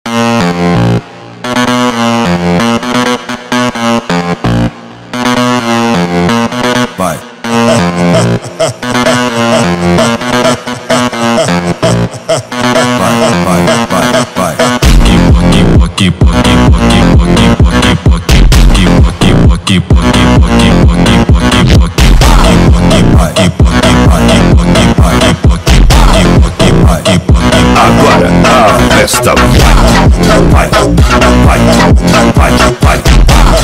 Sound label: Phonk